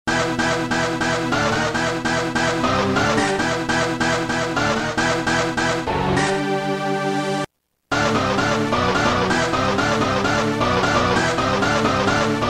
Ravin' Choir, Roland M-DC1

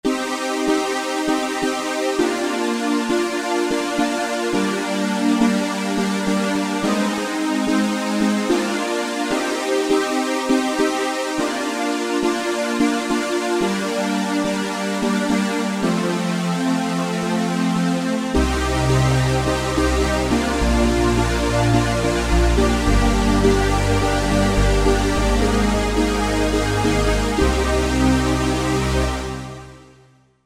Thick Matrix; Roland SR-JV80-04

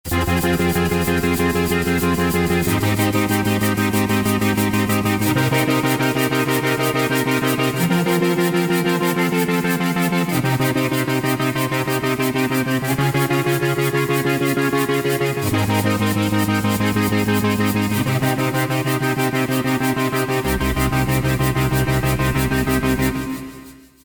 Sand Trance; Alesis Rap Techno Dance

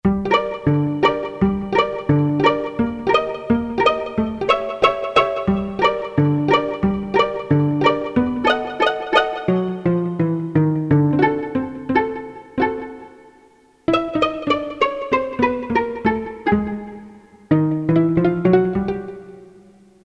Pizz; Korg DSS-1; KSDU-007 Bass Groups

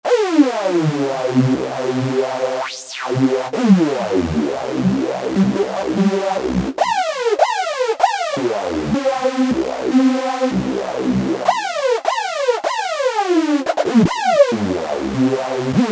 Korg microKORG Factory Voices; Domin8or

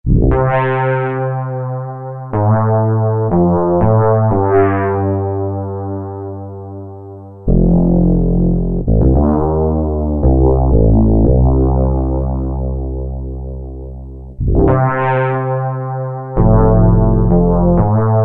BA FMFrtls, Yamaha TG77; Factory Presets

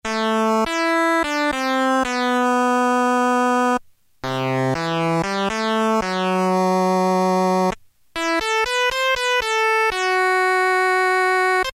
cosmic, Technics SX-K450 PCM Solo Presets

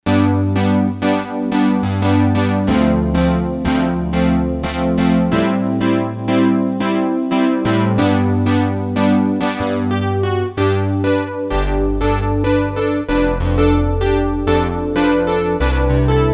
Fat Pad, Korg DSS-1, Brass

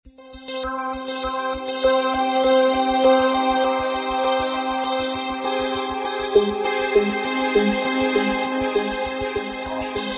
Shimmer Pad, Roland V-Synth; Factory Patches

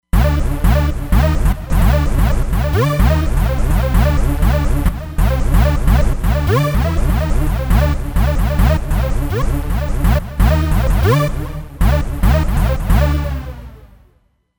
Hoover, Roland Fantom XR; Alpha Hoover

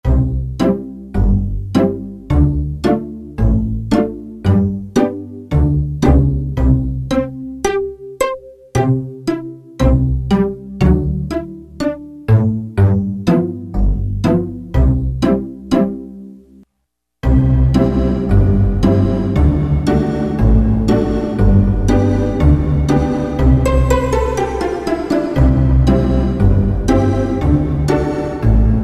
Large Warm 2.3 sec, Alesis MidiVerb II

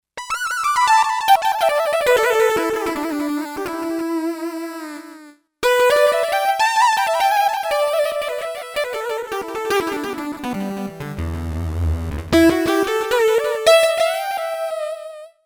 SAW Lead, Roland JV-880; Factory Patches

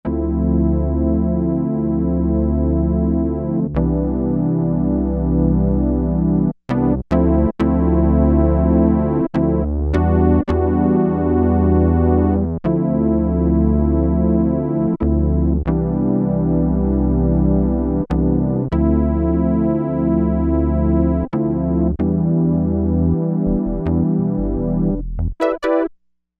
Calm Analog Beauty; synthesizer; Guess the synthesizer game

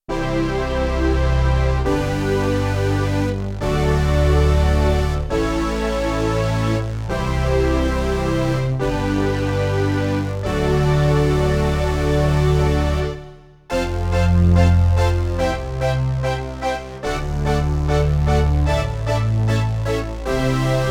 Mother Pad, Roland D-70; Factory Performances

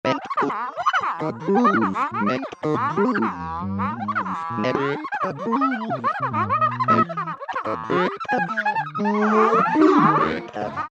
Roland V-Synth Happy Cartoon; Factory Patches

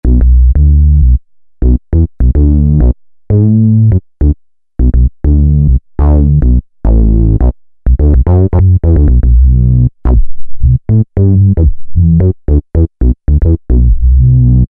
System 100m, Roland SR-JV80-06 Dance

